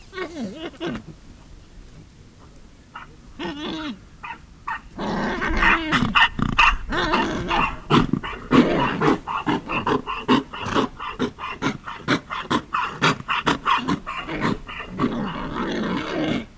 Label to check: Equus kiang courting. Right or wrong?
Right